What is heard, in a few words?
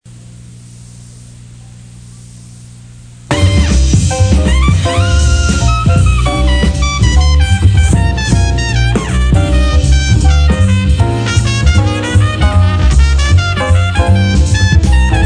jazz bit